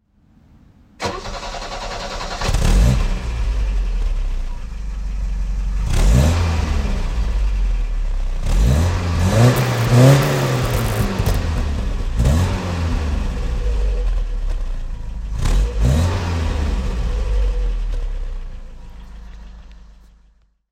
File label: Opel Rekord D